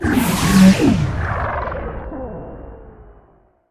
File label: Event Star Gate Open; Taps to Riches; Android